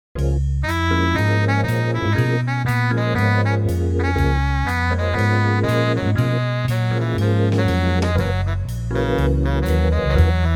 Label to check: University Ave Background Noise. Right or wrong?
Wrong